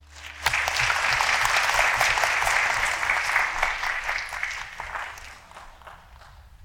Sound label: short clap